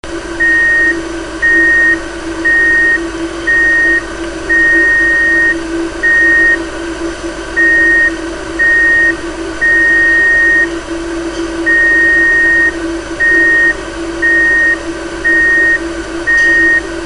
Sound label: Opera